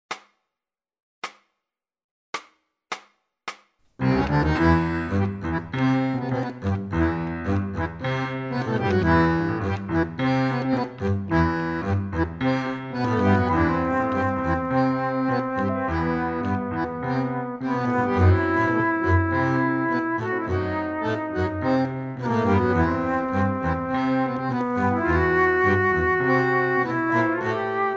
play flute referencia

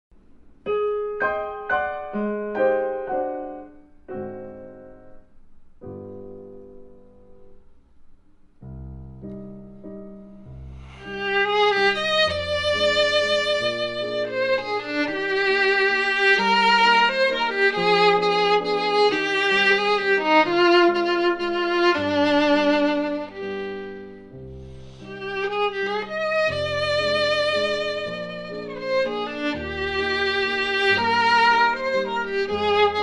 Cm play referencia violin

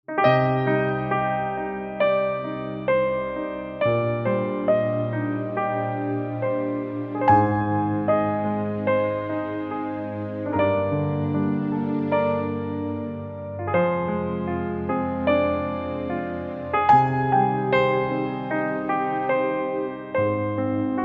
play violin reference

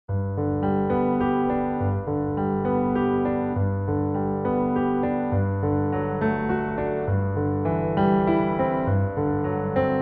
piano

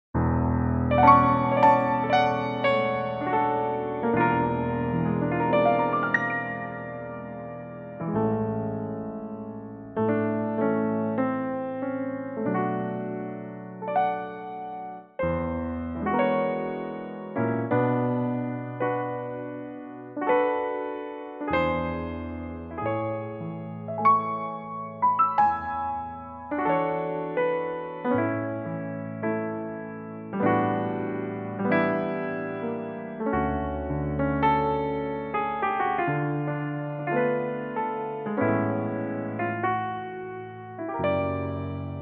A piano

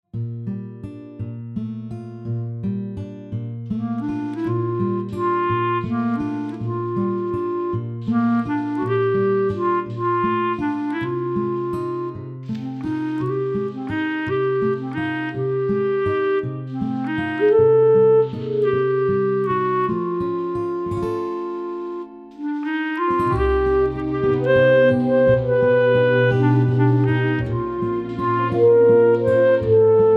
Bb play clarinet melody

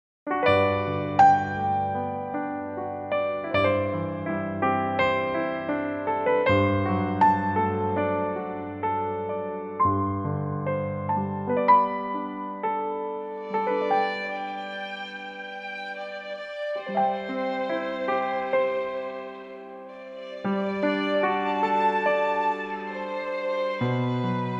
piano play violin reference

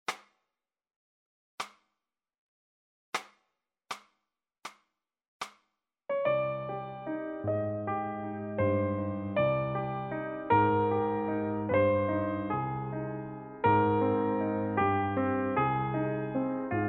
Cm piano play

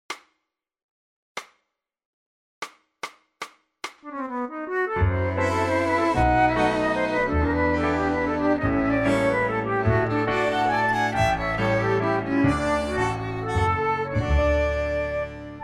D play full referencia flute

